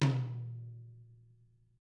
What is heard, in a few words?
HIT, Drums